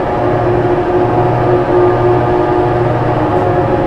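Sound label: elevator run loop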